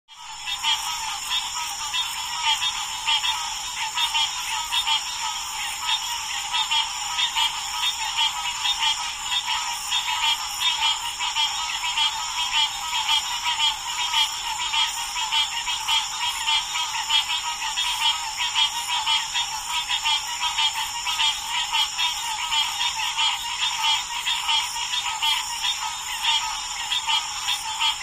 Croaking Frogs Close, Croaking Frogs, Close Perspective With Medium Distant; Croaking Frogs, Close Perspective With Medium Distant Cricket Chirps. One Medium Perspective Insect Fly By.; Woods